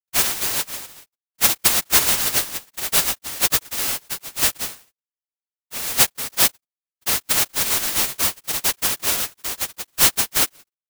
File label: white mod, White Modulated